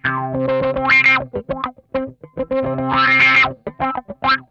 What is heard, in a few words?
GRAINY WAH, Funk Guitar; Zero G - Funk Guitar